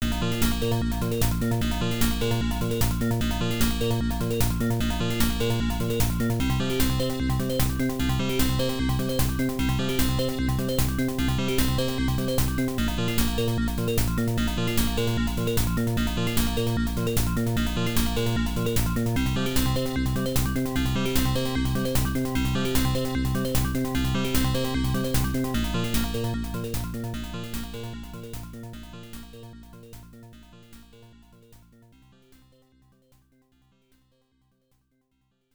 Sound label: Area Boss; Aleste; MSX2